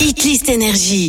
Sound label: Acap; NRJ